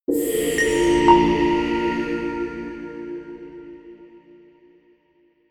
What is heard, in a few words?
crystal logo, LOOPS